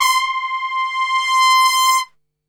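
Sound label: C 4 trumpet; Best Service; Brass Super Section; TRP SWL; 90 s Sample CDs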